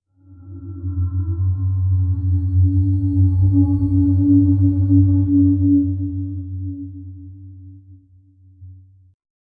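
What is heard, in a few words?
deep ancient temple ambience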